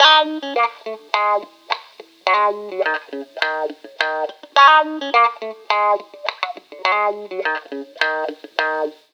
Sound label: RI GEL, Roots Funk; USB Soundscan vol.41; AKAI